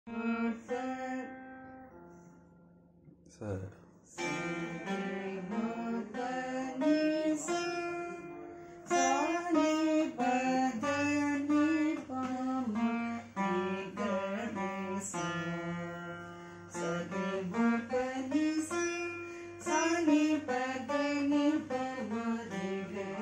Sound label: Veena